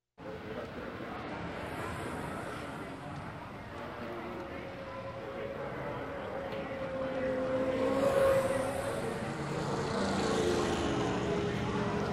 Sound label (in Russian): proezdy s objavlenijami promezhutochnyj jetap